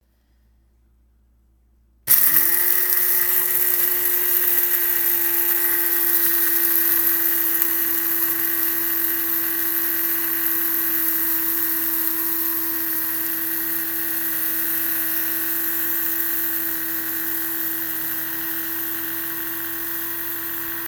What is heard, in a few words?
Coffee grinder